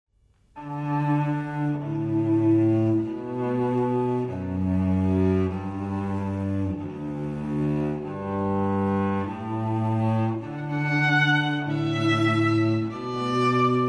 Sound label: canon pachelbel fl cuerdas; Canon en Re M- Pachelbel1; ENTRADA NOVIOS